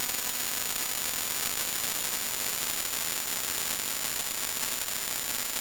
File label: Radio